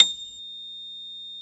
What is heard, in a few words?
Piano Ab5